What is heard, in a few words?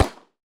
gun